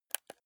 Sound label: console controller joystick press